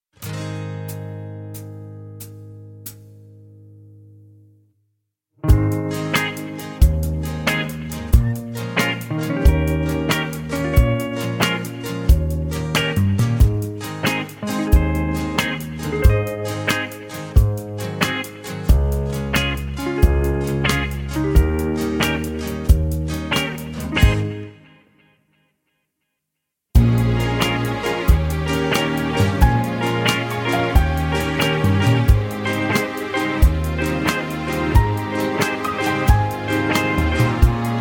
No Backing Vocals, (Ab To A)

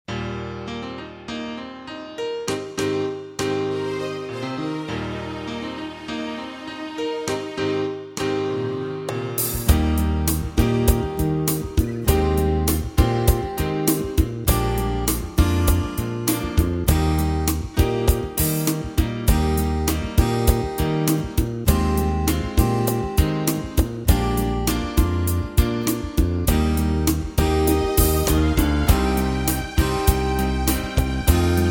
Eb, backing tracks

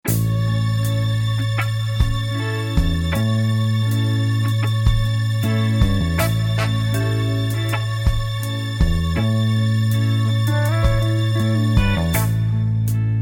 (D) No Backing Vocals

